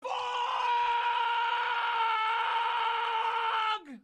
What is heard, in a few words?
Fog Warning